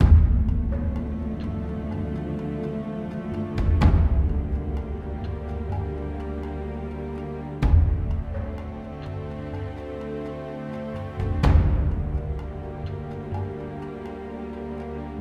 Tension Serious

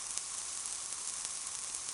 Spray Needs Shaking

